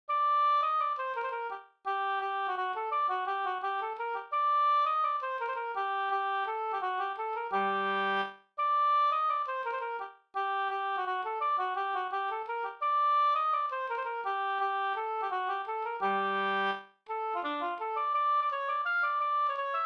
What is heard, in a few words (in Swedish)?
vals; Lapp-Nils